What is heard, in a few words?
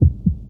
Heartbeat Quick